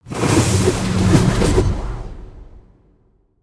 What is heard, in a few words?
Shuriken Barrage; Shinobi